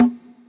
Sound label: Conga; Equipped Music; Loungin House